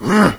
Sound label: zombie attack; doom3